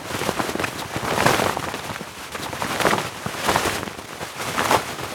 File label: foly jacket flapping loop; foley; Call of Duty Modern Warfare